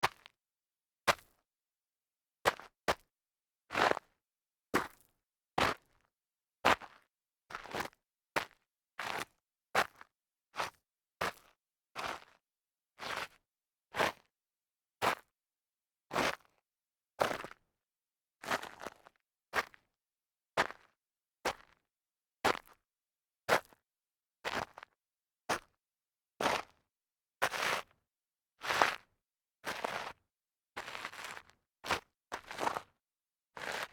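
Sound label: sneaker scuff light grit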